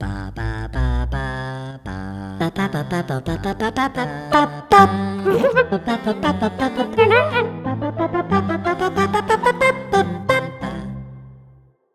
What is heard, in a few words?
Pizzicato Polka A capella with Music